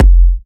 Kicks F; markus hakala

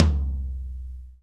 LO TOM

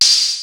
drum; Rubber Chicken Software Sample Bank; DRUMS + PERC; EMU

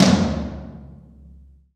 TOM TOM, Roland

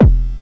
Electron Sidstation, Drum Machines

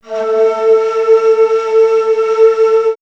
Bamboo Flute; X-Static Goldmine; backtothe70's

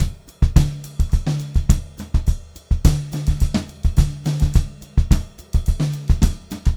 VAR, Funk-Groove Drumloops

